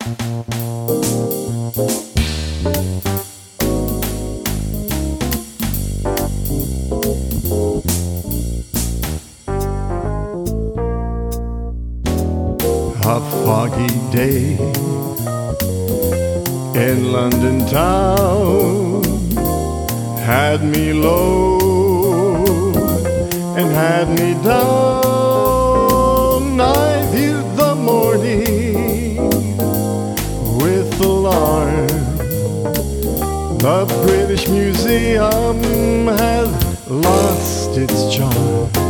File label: VOCAL